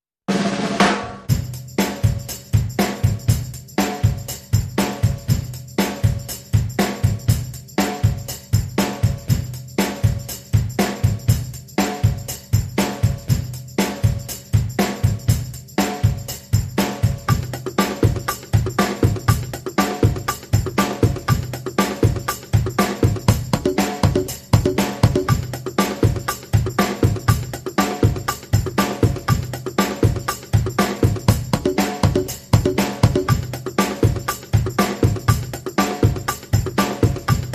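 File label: drums and percussion only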